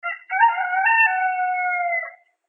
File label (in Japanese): ニワトリ